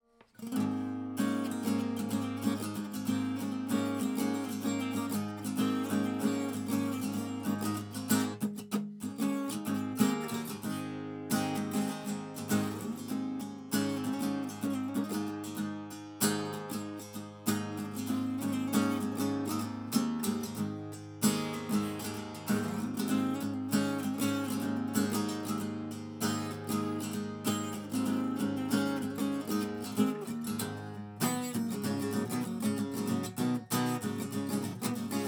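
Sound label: AC GTR HI, acoustic guitar; 97 Percent Fire Studio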